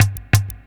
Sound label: Loop Bit, Processed Percussion; Sonic Foundry (Sony Creative Software); Rhythmicronics